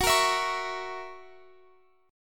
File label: Gb 7th Gb down Guitar Open F; Gb7 strummed